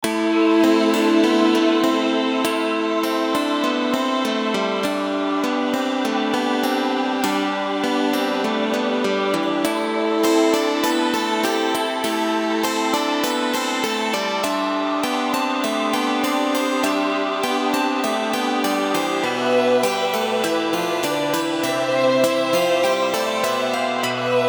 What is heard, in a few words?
Medieval Forest